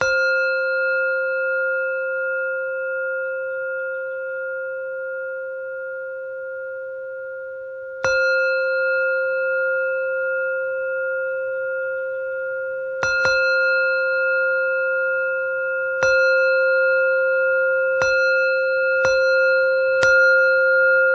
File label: Zen Alarm Chime